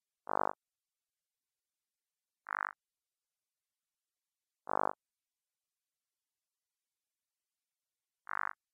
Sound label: synth; monopol